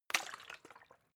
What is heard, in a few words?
bottle water upend light; berufe